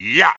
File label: effort; wario